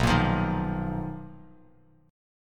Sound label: B Major Sharp 11th B, Bass Standard; BM#11 strummed; chord shape; 7,13,15,8; JGuitar